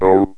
AOU, ELEC VOX; East West - Global Sounds

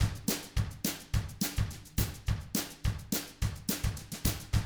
TRAIN, Double Platinum Drums; ILIO; Double Platinum Drums 1

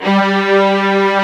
STR STRING, Orchestral Strings

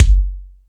Dance, Drum Machines

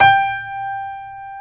G4 piano; AKAI S-Series CD-ROM Sound Library VOL-8

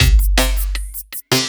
LOOP, 160 BPM, Drum & Bass

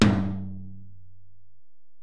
Tom, Alesis DM-PRO

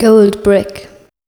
PHRASE, Voice Spectral

